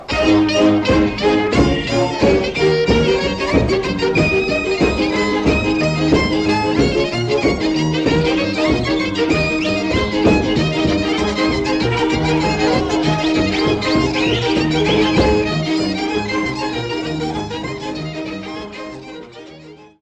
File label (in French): Polka - Pologne